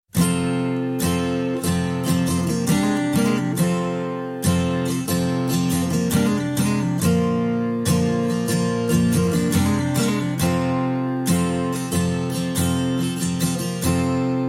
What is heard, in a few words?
Acoustic